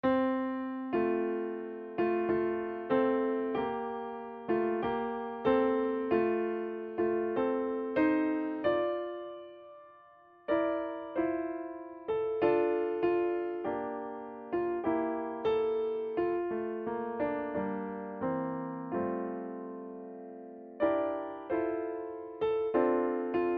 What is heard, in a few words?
Auld Lang Syne, Midi